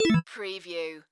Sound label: Casino Slot Machine Organ